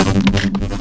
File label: Synth Shots; Vengeance Samples